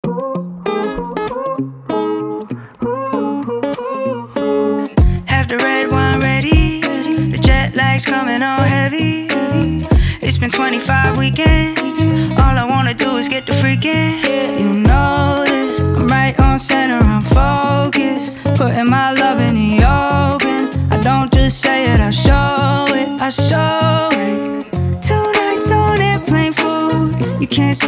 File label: airplane food sped up version